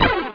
laser cannon hit; weapon

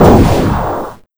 missile launch; weapon

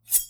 blade hit; weapon

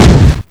outlaw gun, cannon; weapon

